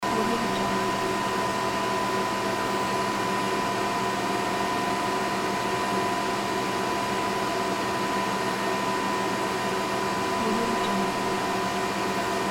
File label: server room ambience